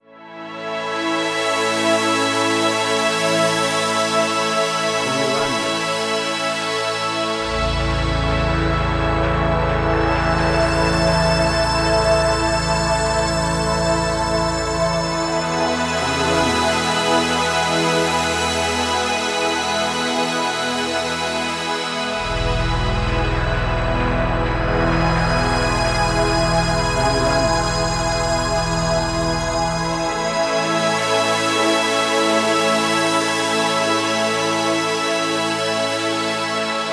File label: Basic Pad Atmosphere